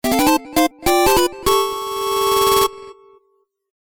Change Outfit Fanfare (8-Bit)

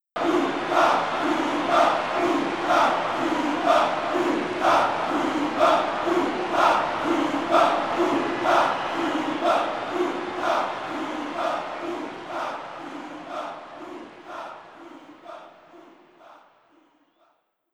Koopa Crowd Chant; mario strikers charged football wii gamerip 2007; Bowser JPN

